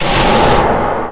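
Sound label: silo platform move; Dystopia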